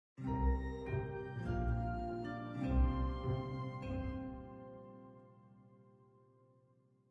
Item Sold; Athenas Fortune

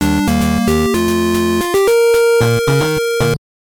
Win; Hollywood Squares

